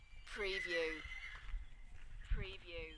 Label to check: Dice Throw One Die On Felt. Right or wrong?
Wrong